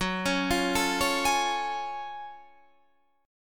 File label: Gb Minor Major 9th Gb